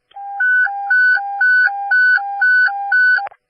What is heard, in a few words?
P25 Pager Tone